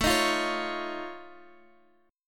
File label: F Augmented 7th A, chord